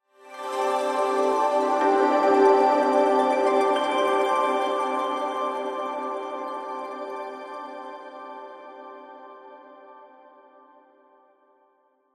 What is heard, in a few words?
Heavenly Pad, Heavenly Pad Short; Synths; Blastwave